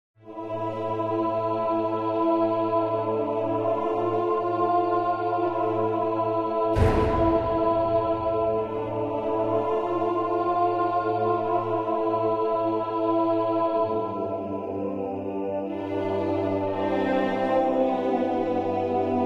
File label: Fire Dungeon Music